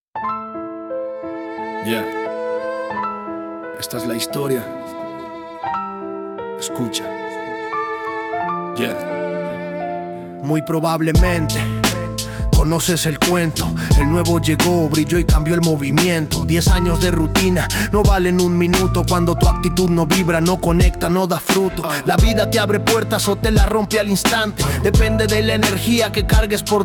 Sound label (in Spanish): RAP